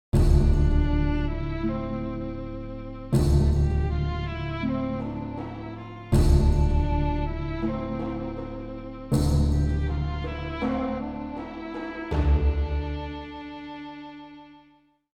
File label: Jingle Negative; The Guild 2 Renaissance